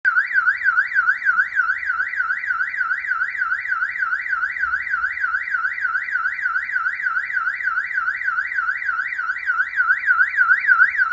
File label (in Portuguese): sirene alta potencia